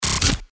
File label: bbbb zwing; fx micro